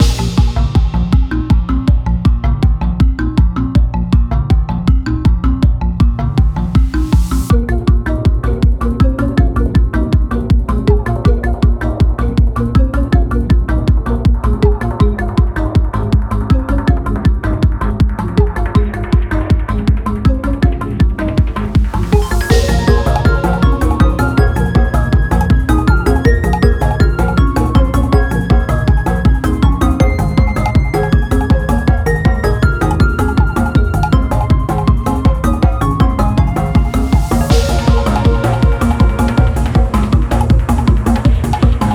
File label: boss jungle